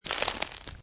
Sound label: Snow And Ice Ice Stretching; also good as bone break, breaking bone sound